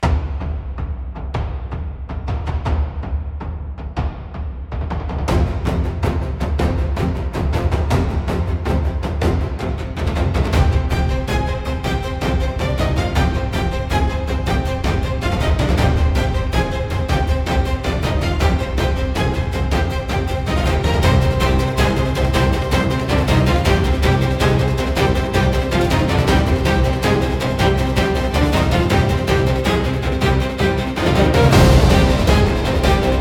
Thunder of the War Drums, cinematic japanese style battles